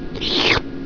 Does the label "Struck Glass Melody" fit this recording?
No